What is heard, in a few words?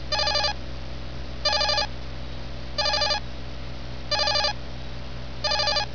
incoming